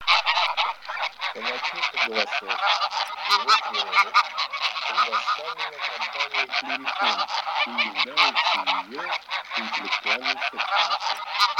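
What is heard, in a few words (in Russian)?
Гусь серый базар Oche Selvatiche, манок; plurifon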